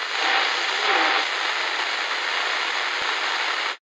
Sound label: radio noize; STALZONE